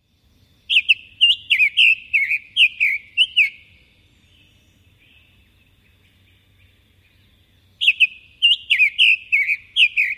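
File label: songs, Summer Tanager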